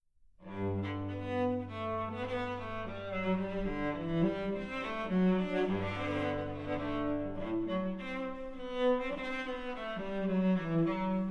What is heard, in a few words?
Menuet, solocello